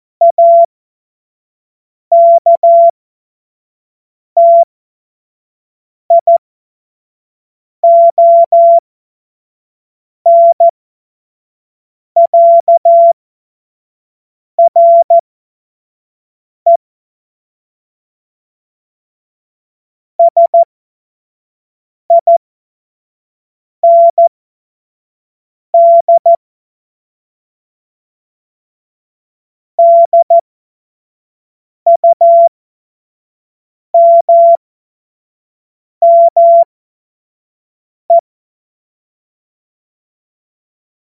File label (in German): Witz, cw morsen; Tempo 25 F70